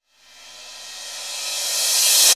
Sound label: Cymbals Reverse; Vengeance Samples